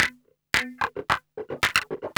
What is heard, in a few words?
Wah; Best Service Gigapack 2